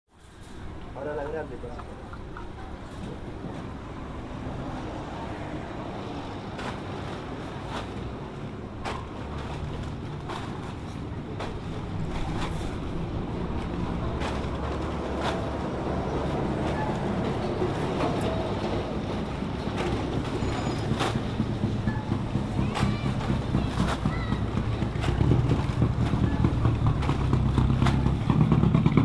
calles; rivarola y fader

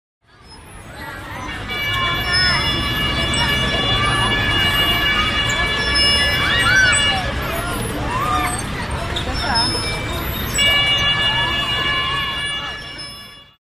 parques niños y niñas hamacas; parques de la independencia; Rosario

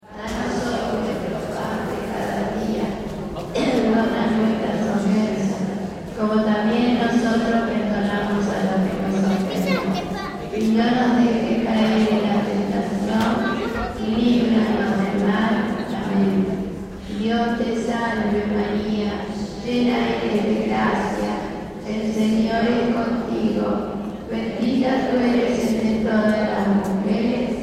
religion parroquia jueves santo; natividad del senor

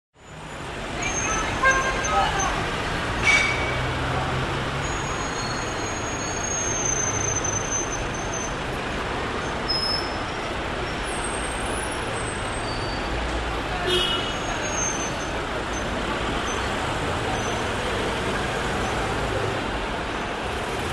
calles; paraguay y cordoba

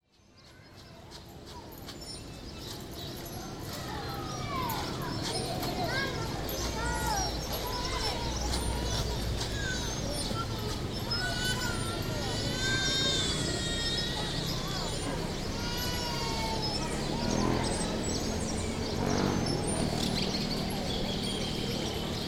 plazas; pocho lepratti

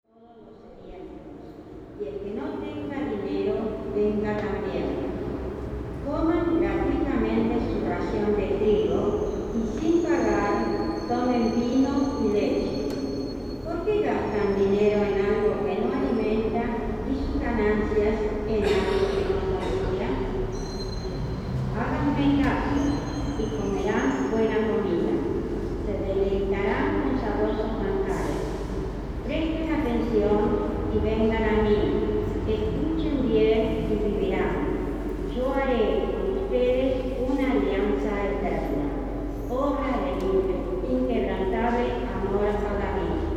religion parroquia misa; maria auxiliadora

